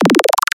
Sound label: Blip Wave